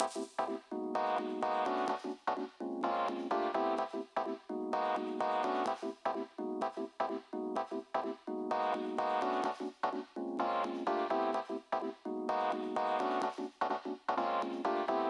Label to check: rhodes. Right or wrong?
Right